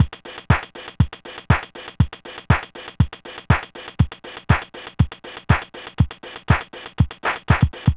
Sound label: Apple II Disco Beat, Drums, Chiptune; Sonic Foundry (Sony Creative Software)